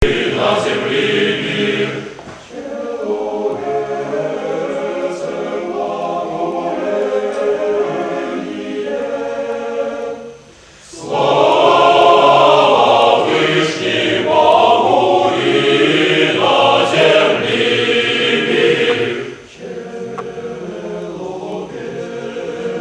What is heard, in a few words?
male choir, live; slava vvyshnih bogu; male choir of mephi